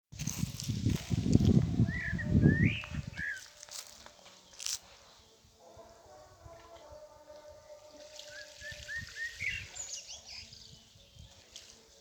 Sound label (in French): Cors de chasse